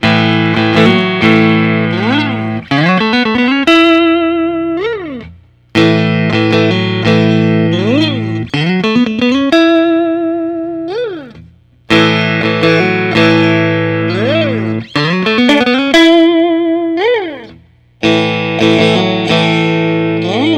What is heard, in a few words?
Stratocaster